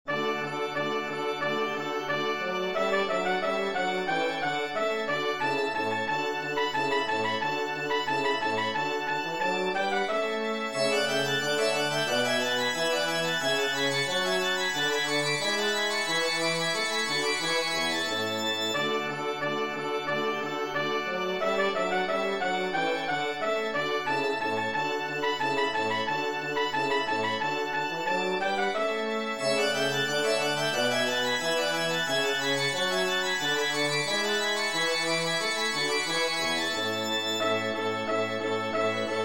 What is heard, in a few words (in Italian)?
fanfares per grande organo; trascrizione da concerto